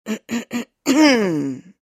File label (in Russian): zvuki pershenie v gorle kkhm